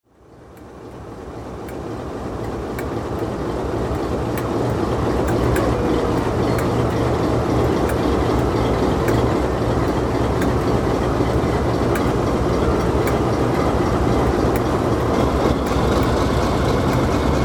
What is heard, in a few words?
diesels, dep Springfield